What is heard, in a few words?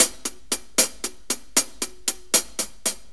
6-8 HiHats, HiHat Ride Loops; Drum Tools